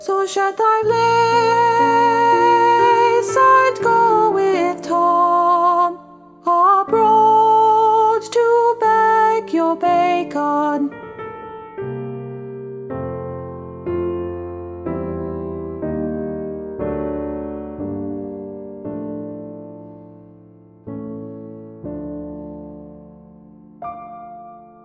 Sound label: Piano Harmony; Hear bars 201-209 with just the orchestra; 2020 Cutlass Crew